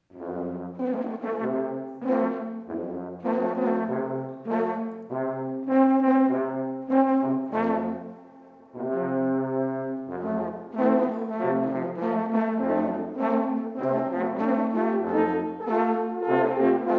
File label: Blues; Deggenhausertal